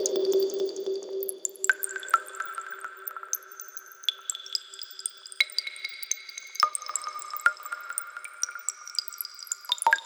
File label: fx twinkle bloop foley reprocess alt Emin; QUARTZ